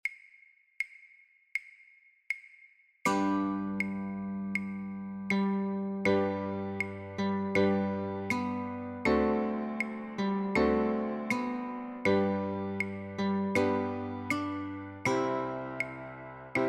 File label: Notes & Chords, Guitar; christmas